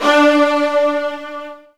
D2, Virtuoso Strings; ILIO; Kirk Hunter Virtuoso Strings VOL-2

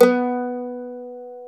mandolin, Stereo Mandolin

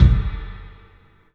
KICK FX, 3-D Percussives; E-MU Producer Series Vol. 5 – 3-D Audio Collection; P.A. Kit

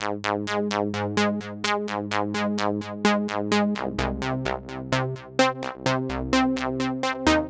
128 Sq Synth, 128bpm; Nu Dance Electro; Zero-G; Soundclash; Combi Loops; Pride Joy

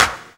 CLAPS HOU; Best Service Dance Mega Drums

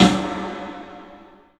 PA DEEP L, CLUB SN VRB; 3D Sound; 3D Drums

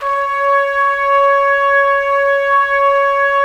BRS TPS, Trumpets; Roland - LA Composer vol. 4

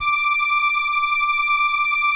ELKA, String Machines

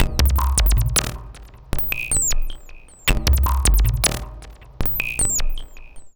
Mod Loop, Modular Percussion

